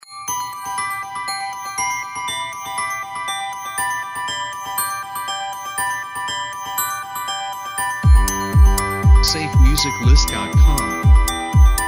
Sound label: Modern Pop Background Intros; SafeMusicList